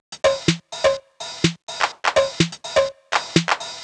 drum past top 125, Drum Loops, Classic Chicago House